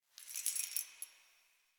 Keys Jingle

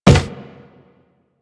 Walk Wood, Footsteps; Warframe; Operator

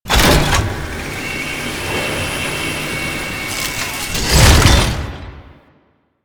Elevator; Warframe; Entrati; Necramech Hack

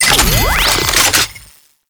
Shields Up Battery Delivered; Tau; Twelve Minute War

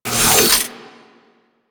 Alt Shot Stop, Weapons; Warframe; Tenno; Miter

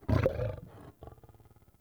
Wolf Growl Run; Animals

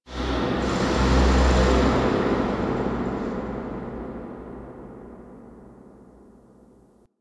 Drill Blast C; Warframe; Ambience; Extraction 2; Extraction 2 Drill Blasts

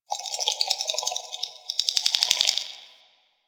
Cave Creature, Ambience; One Offs; Creatures; Warframe; Quests; The New War; Drifter Camp

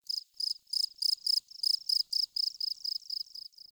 Forest Open Glade Cricket Close, Day; Ambience

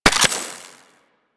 Reload, Pistol; Weapons; Grineer; Amphis

